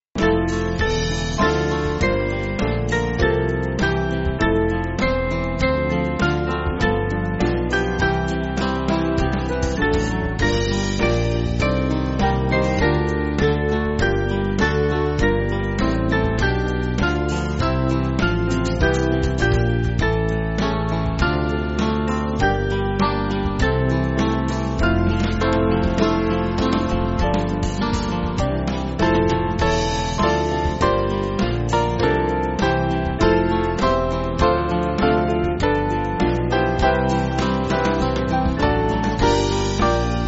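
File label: Band, Music Backing Files for Churches; Dear Lord And Father; Georgia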